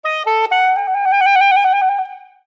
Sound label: Saxophone; LG